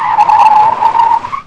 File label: skid stop, Car Skids